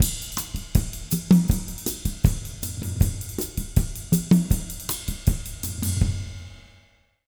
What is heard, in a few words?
160, Jazz Latin Drumloops; USB Soundscan vol.08; AKAI